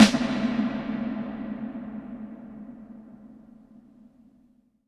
IMP SNR DRUM, Drum, Cinematic Impact

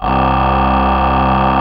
SYN Morp C3; Best Service Gigapack 1

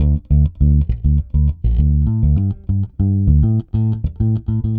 Pop, Bass

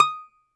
DOMRA, Russian Strings; E-MU Producer Series Vol. 7 – Old World Instruments

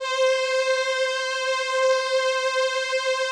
VP-330, String Machines

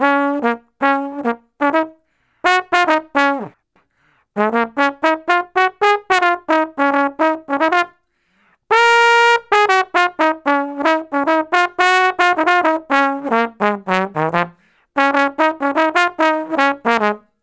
Trombone Solo, Jazz

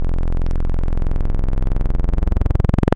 Pulse Width, wavetables; Waves Codex

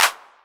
808 Clap; Novation Drumstation

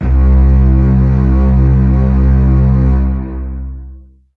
Synth, bass

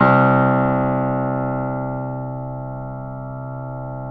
Keyboards Acoustic, C1; USB Soundscan; AKAI

